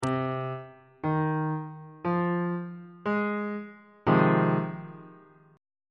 f minor 7th 2nd inversion

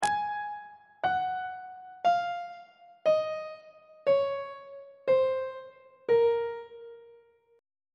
a sharp minor scale descending; on treble clef

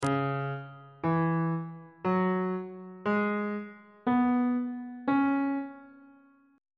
c sharp minor pentatonic scale on bass clef

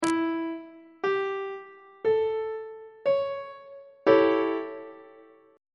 a dominant 7th 2nd inversion; treble clef

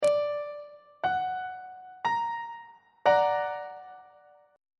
f sharp augmented 2nd inversion on treble clef

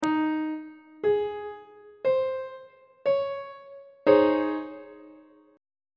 c sharp major 7th suspended 2nd 1st inversion; treble clef

